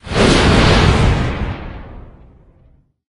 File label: skill start; Endless World Idle RPG